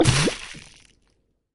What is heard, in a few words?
car oil splat; lightning speed